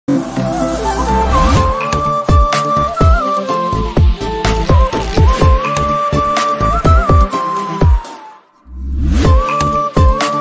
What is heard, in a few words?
flute remix ringtone